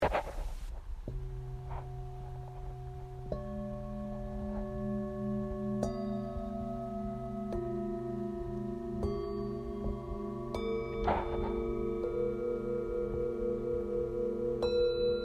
Set 7 bols bengale

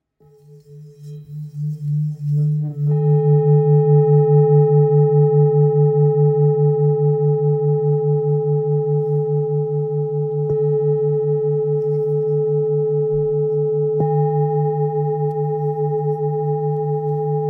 Bol therapeute re

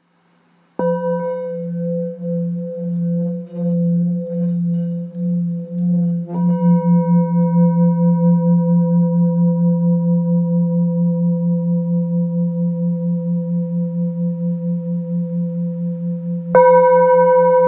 Bol bengale fa

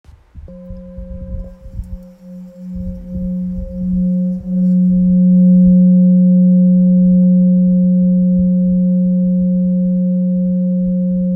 Bol bengale fa diese

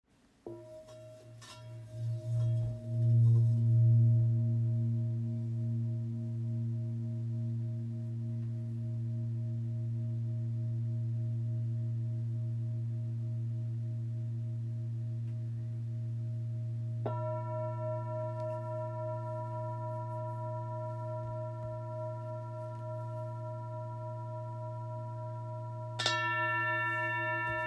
Bol real FM la diese; Capricorne 1.925kg

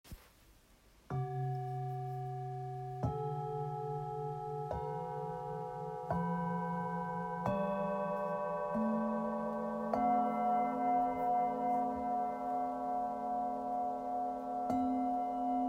Set harmonie

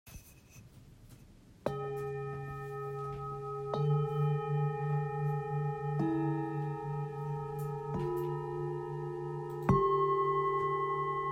Set 7 bols vent

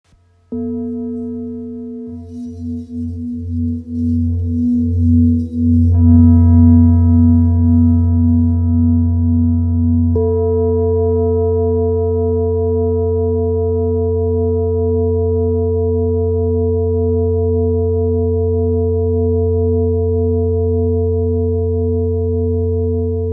Bol ramgandi sol diese

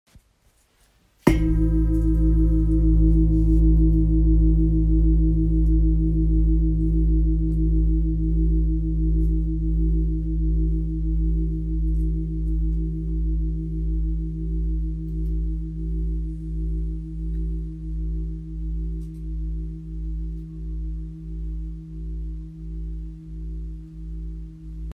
Bol grave fleur de vie chakras la

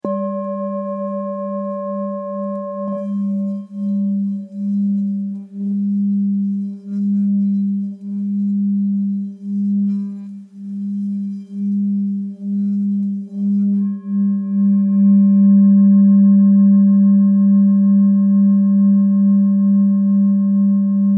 Bol bengale sol

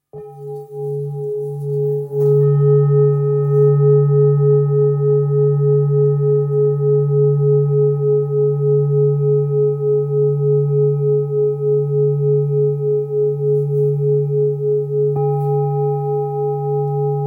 Bol super lune belier re

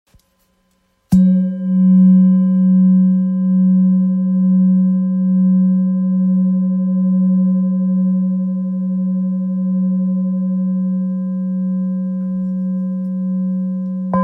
Bol thrapeute fa diese 840g

